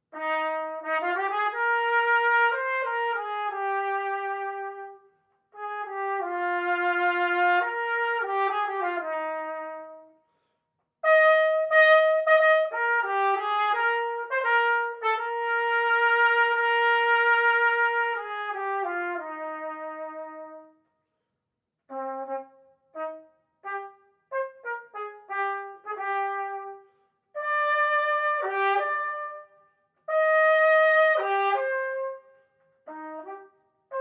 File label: Junior Trumpet